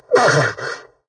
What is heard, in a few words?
redout short; War Thunder